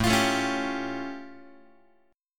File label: A Augmented 7th A Guitar; strummed; x,5,8,7,5,6; JGuitar